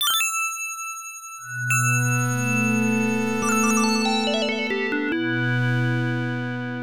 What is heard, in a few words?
War Drum; genesis; strider